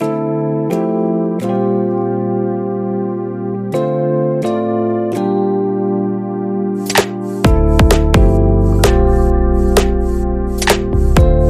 Electronic Latin Party Inst

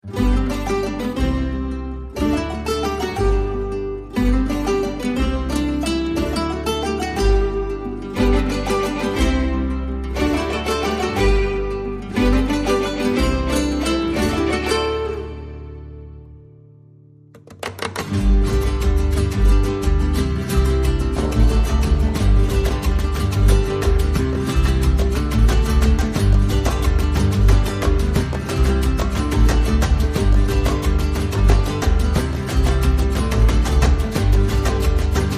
No Brass

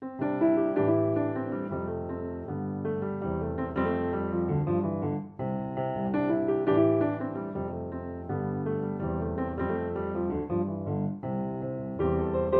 Solo Piano

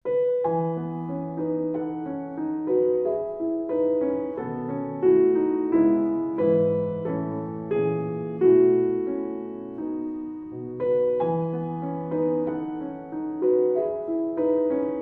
Solo Piano

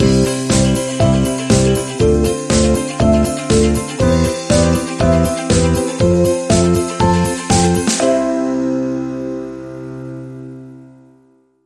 Moonshine, Sting Upbeat

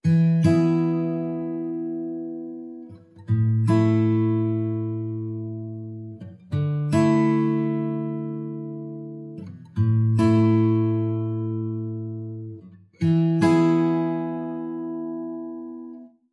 Bright Sunny Afternoon, No Drums

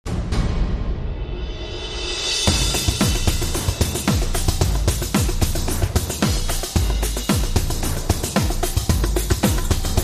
Vampire Drums

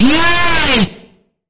Sharp Bend, F; Sharp Bend Ahead

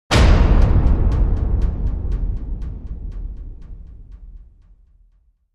Hit

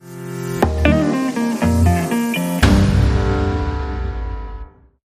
Modern Guitar Beat

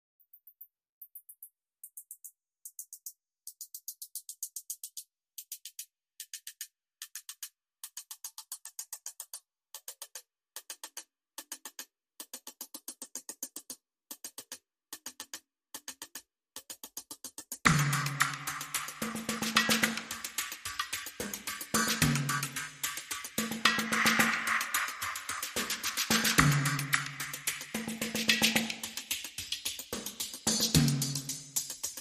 Drums Only

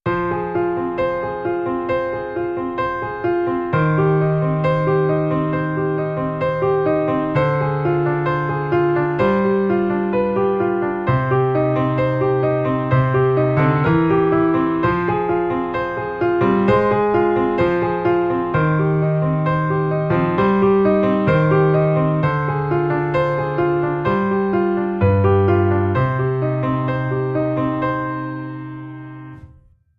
Piano Intimate; 30sec

